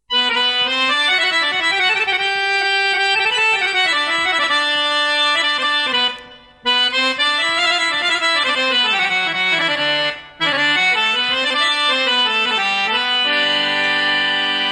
Harmonium

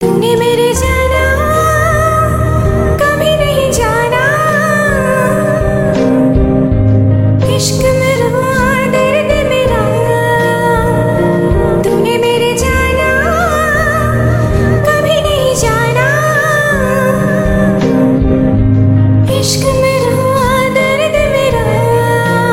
Emptiness Female

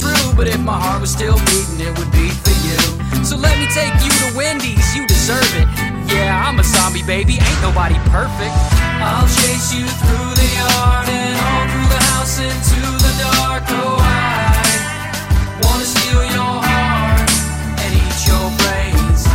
Funny Zombie

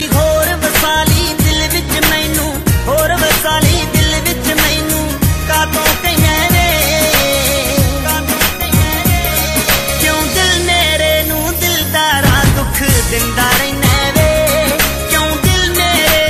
punjabi

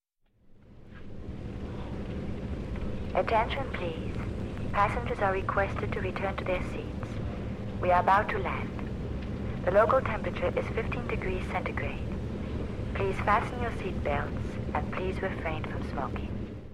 Airplane

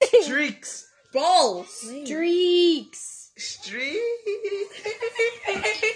voice crack

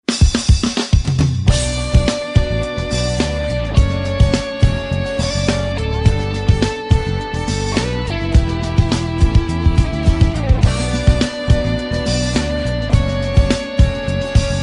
Music; Don2

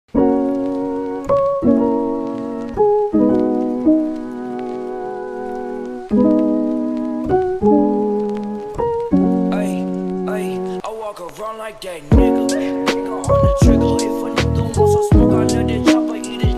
lofi remix

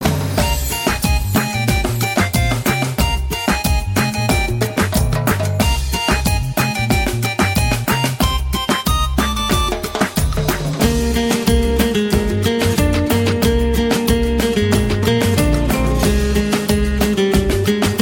Nice song

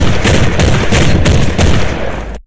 Bolter Fire

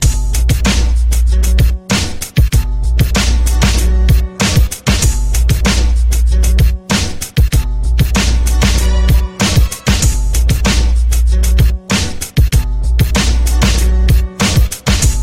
Gangsta Beat 72

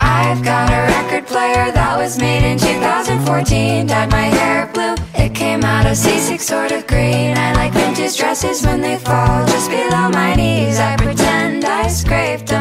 Record Player Song

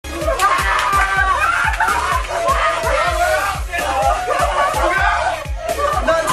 Laughing

